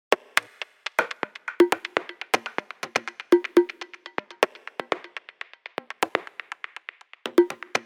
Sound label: 122 BPM Congas; percussion essentials 1